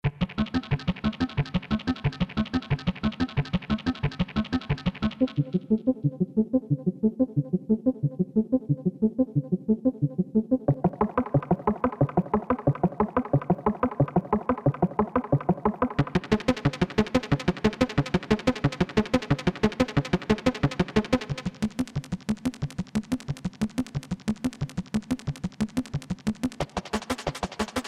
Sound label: Blips